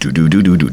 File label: test music